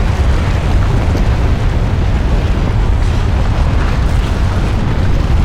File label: Volcano Lava Erupt Loop; Destruction